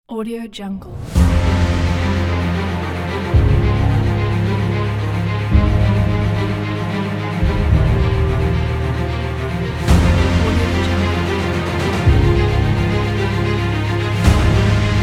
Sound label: epic; furfurmusic